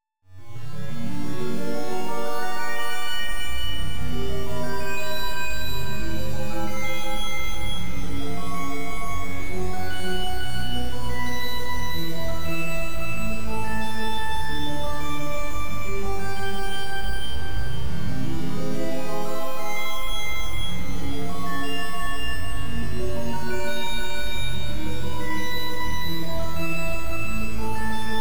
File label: hall envelope